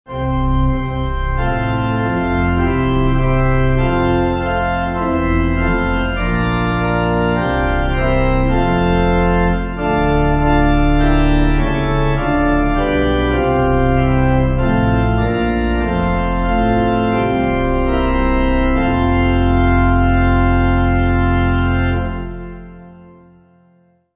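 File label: Pipe, Pipe Organ backing; Stuttgart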